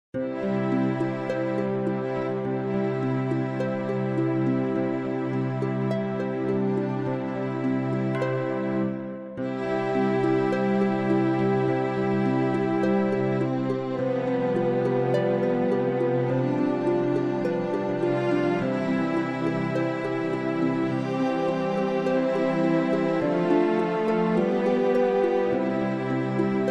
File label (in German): nur Begleitung